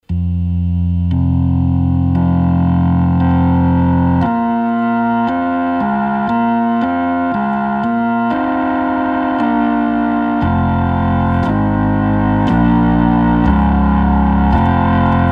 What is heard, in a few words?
Hammond 3300w Rock